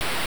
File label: Hi-Hat; Genesis